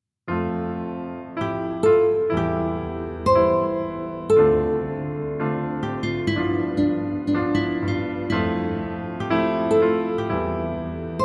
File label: Jazz for A Rainy Day Ambient Piano